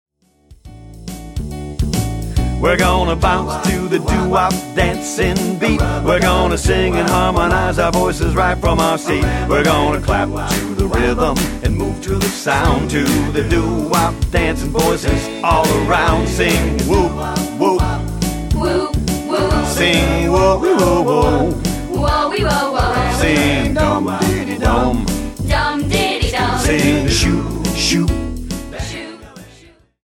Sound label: doo wop